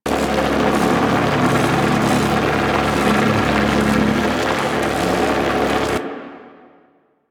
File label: Ship Laser Canon Beam; Warframe; Ambience; Grineer Railjack POIs; Grineer Super Weapon Ship